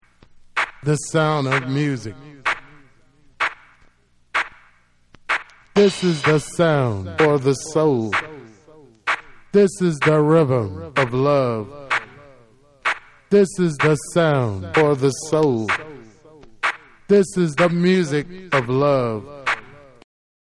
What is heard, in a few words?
Chorus Acappella